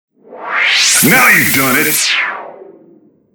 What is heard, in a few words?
Air high; Pop It for Gold